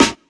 raw snare c key, Loudest frequency: 2319Hz

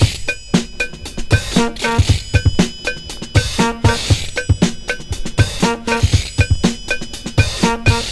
118 bpm fresh breakbeat f sharp key

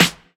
clean steel snare drum f key

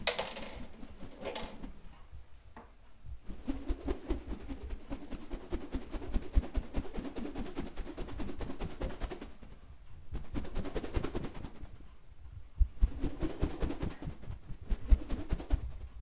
spinning toy with metal ball

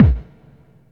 rich low end kick drum sound c sharp key

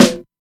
low steel snare drum A key

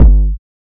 hot kick sound g key; kick drum samples

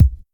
natural steel kick drum sound c sharp key

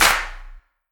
large room clap sample g key; single hits one shots

